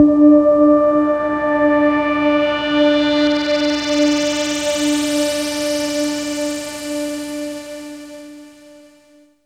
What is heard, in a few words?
Transform, Pads; Jungle Warfare 1&2